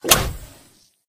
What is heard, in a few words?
Bear Trap Snap; Ob Sleepy; Doodle Jump 2